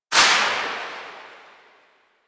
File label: Pistol Silenced Tail; Weapons; Warframe; Drifter; Operators